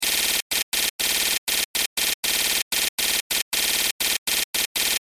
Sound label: Sci Fi Type Beep Cursor Loop; Control Panel; Blastwave